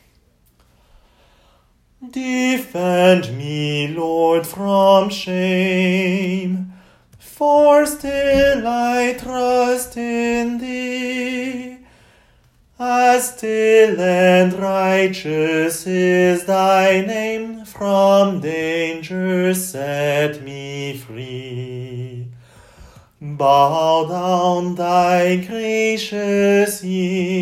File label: soprano; defend me